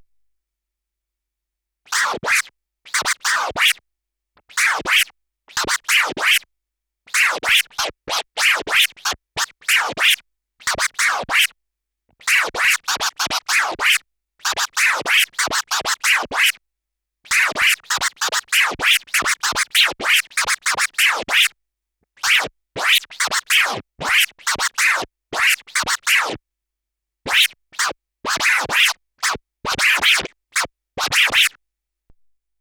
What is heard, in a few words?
Skratch exercise